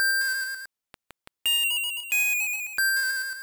Sing

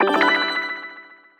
Jingle Regular Rank Up

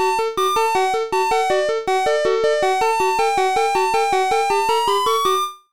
Jingle, CLASSIC FROG DOCK COMP; Frogger Helmet Chaos; nintendo ds

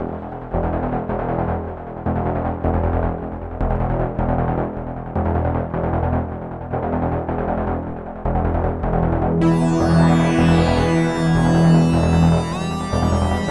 synths; the warriors 2005 ps2; destroyers fight2

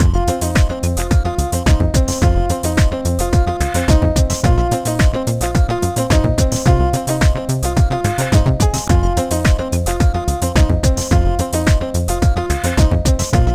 Menu Music; NDS

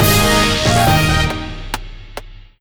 Jingle Victory Battle; Asterix Obelix XXL 2

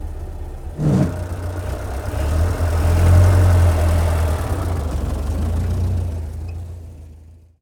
Car Stop, Idle, Doors, Gear, Leave; The 39 Steps

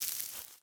Foot Weeds Bare Walk

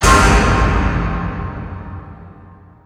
Breather Jump

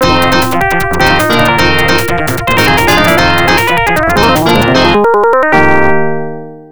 Power Up of The Car; F-1 Dream; arcade

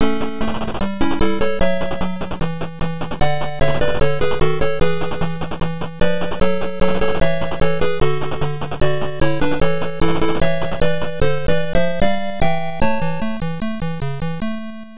All Clear; commando atari 7800

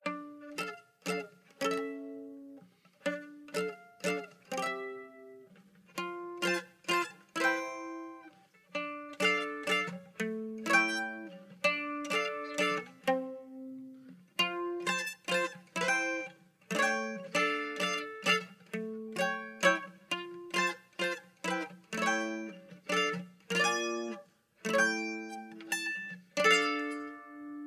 Guitar; Assassin's Creed Unity Dead Kings

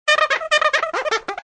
taunt; tempala

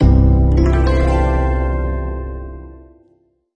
Stinger Date Hangout Complete; Android

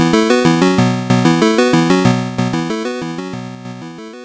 Level Start; Gauntlet; Master System

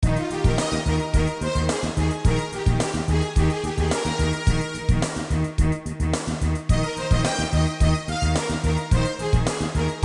Background Music; little big adventure 2 twinsen s odyssey original soundtrack

